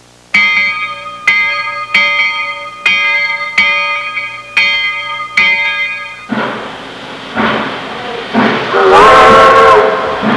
TRAIN; trasporti; treni

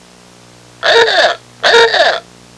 old car; trasporti

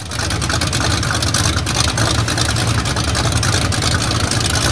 RACE CAR; trasporti; auto

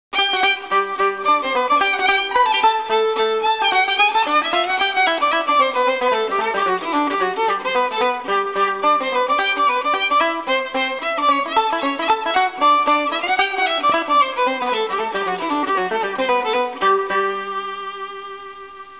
suonerie musica traditional usa country; american

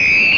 WHISTLE, fischi; persone

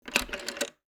rotary phone

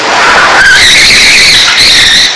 PEELOUT; trasporti; auto

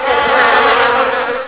bee swarm; animali; acquatici

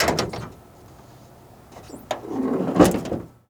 HOOD OPEN, auto; trasporti

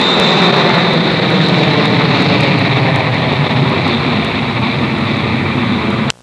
JET TAXI; trasporti; aerei

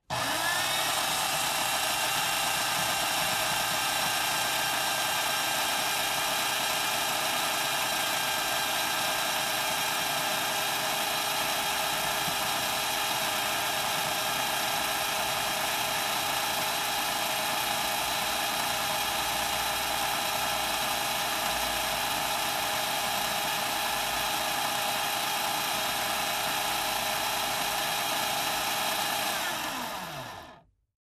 coffee grinder, casa

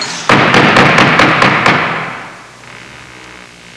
RAPPING

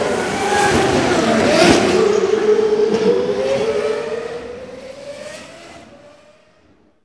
RACE CAR; trasporti; auto

